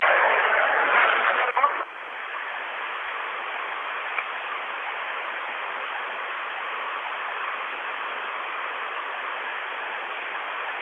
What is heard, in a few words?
ambiance map